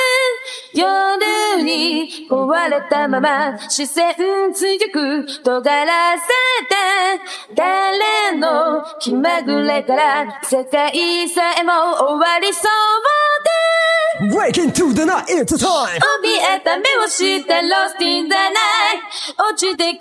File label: vocals; unwa; MelBandRoformer